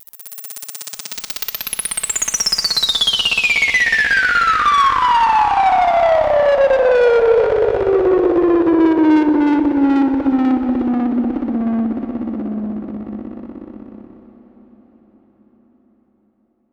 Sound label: Downlifter